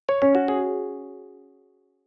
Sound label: Sys App Negative; Oxygen; plasma